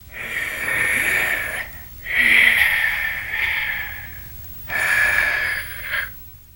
Ghoul Vocals Inhale

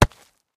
Concrete Chunk Drop; rocks & dirt

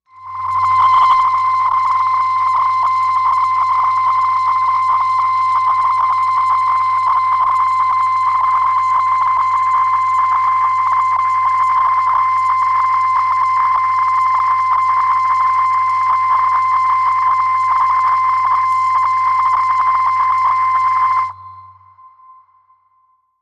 Signal Scanner Machin

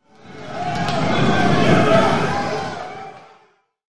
Players Fast Run By, arena foot stomps

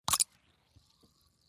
Beer Bottle Opn Opner

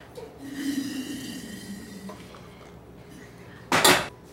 Kettle Pour Set Down, cooking & food; Kettle Pour And Set Down

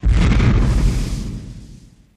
Flame Burst Low, Flame Thrower Blasts

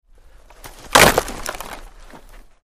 Football Block CU

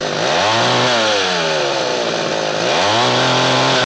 Chainsaw Rev Loop; chainsaws

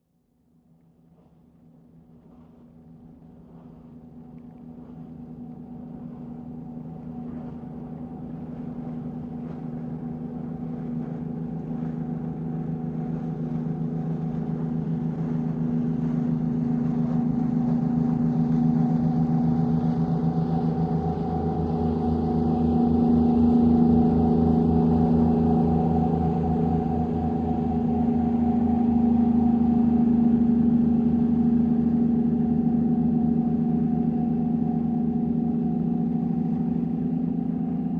Military PBR Offshor

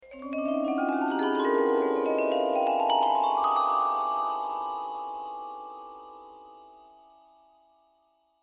Vibraphone Magical, mallets vibraphone

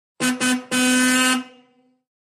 33 Pontiac Horn Blow; 1933 pontiac

